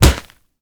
punch grit wet impact

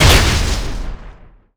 Magic Spell Impact

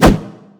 Punch Swing

